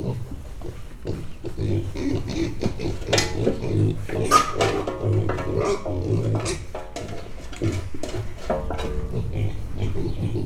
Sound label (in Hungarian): 10 hetesek visítás fémtál, mangalica; Hortobágyi Nemzeti Park